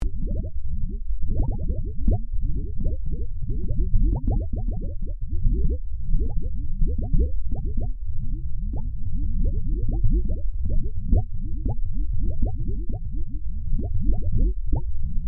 Created Ambience Science Lab, Artificial Sound Effects; Various Ambience created through sound manipulation in FMOD